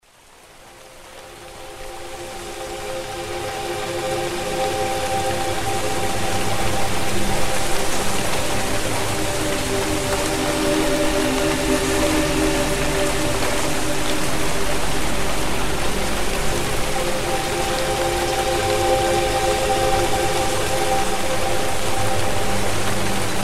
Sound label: Rain Beta 396Hz